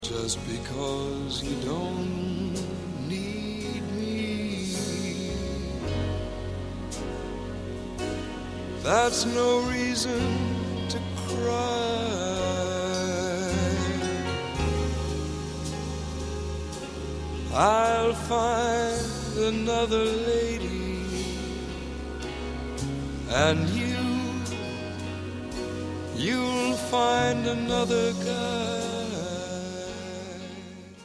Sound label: Cry